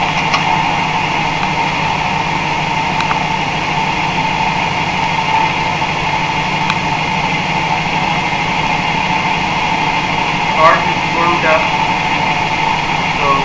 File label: rc f fuel pump, iphone recording 1 inch front of rear driver side; 8.8 after ecu; rc f 2015-present